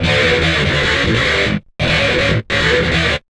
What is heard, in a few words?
GTR, Chemical Beats